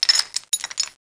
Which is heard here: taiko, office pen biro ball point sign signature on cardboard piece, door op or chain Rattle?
chain Rattle